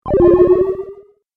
Classic Lo-fi Magic Health Loss, video game sound effects